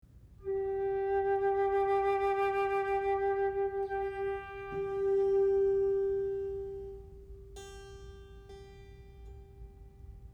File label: sonata for flute oboe cello harpsichord